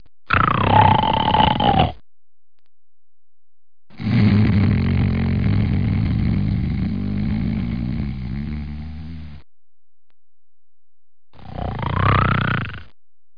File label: SNORE